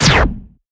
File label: water underwater hydroplasmic laser; futuristic sounds